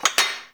Mug Clink; Warframe; The New War; Zariman

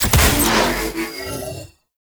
Bullet Jump, Motorcycle; Warframe; Motorcycle Ninety Nine

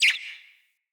Forest Open Glade Bird Far; Day

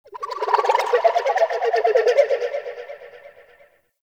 Ocarina; Warframe; Ambience; Nokko Colony

